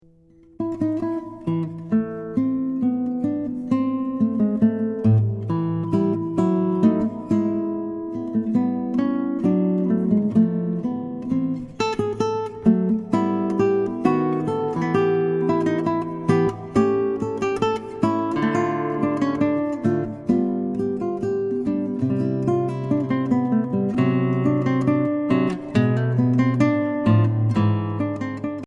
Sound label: Fuga